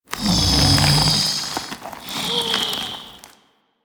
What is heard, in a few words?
Venor Breath; Warframe; Infested Eris; Ambience